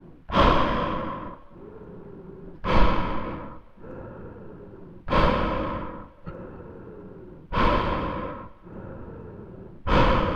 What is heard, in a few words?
medical life support